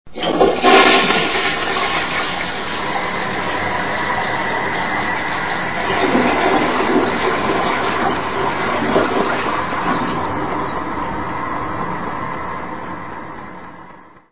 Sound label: toilet